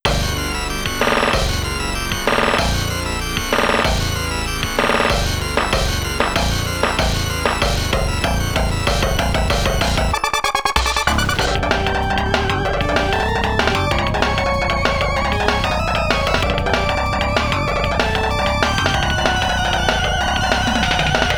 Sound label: Creatures In The Depth FM Synth